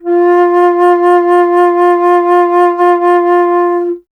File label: Alto Flute, Solo Instruments